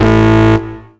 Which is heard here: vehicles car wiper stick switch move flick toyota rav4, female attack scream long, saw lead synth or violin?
saw lead synth